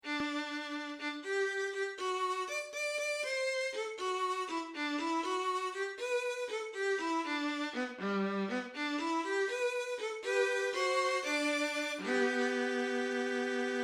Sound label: violin solo